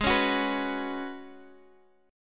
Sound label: F sharp Suspended 4th G sharp; strummed; F#sus4/G#